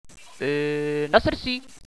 ahh negative; radio bot

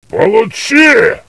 zombie die

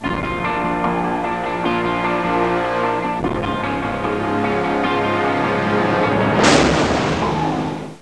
zm win

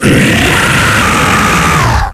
FleshPound normaly; zombie plague

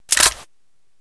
ak74su clip out

